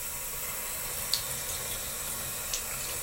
sink; Golden Crayon Sounds

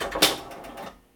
bingo ball; RS Games Sounds

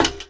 metal step; Original TK Sounds

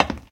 glass step; Golden Crayon Sounds

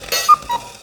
cuckoo clock; Golden Crayon Sounds

